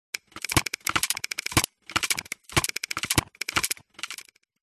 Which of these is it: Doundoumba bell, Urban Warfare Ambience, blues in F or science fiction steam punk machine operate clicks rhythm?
science fiction steam punk machine operate clicks rhythm